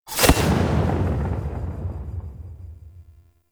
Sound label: Stag Ooze Ground Stab; Enemy; Abilities; Lady Deora